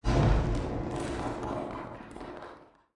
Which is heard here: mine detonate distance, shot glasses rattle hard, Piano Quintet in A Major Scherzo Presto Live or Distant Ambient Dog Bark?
mine detonate distance